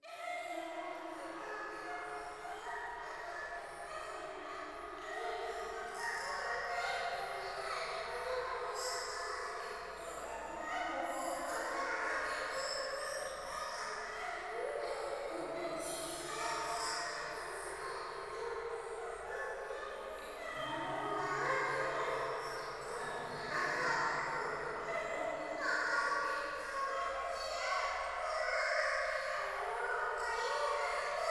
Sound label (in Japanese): 廃墟 喘ぎ 川 の せせらぎ